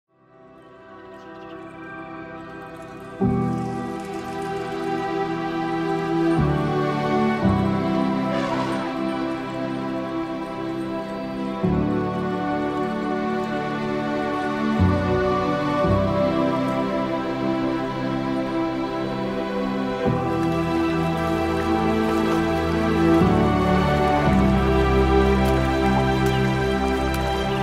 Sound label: Animals of Africa Calming Music; Animals of Africa 4K Scenic Relaxation Film